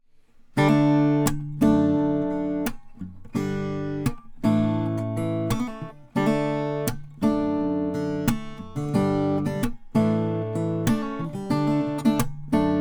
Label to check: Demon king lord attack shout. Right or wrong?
Wrong